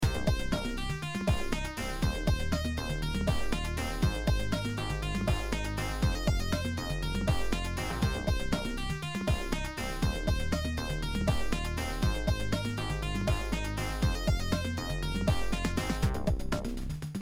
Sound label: bass basstone lead sound beat